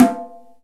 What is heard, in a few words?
Bongo Low; Giga Samples Collection; Orchestra